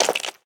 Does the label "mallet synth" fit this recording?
No